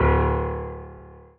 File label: Piano Ff